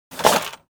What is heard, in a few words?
Metal drawer opening